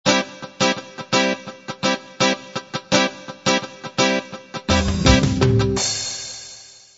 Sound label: pop ballad intro